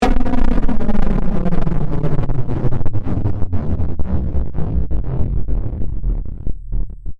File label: Electronic Voltage, Production, Element, Electronic, Voltage, Descend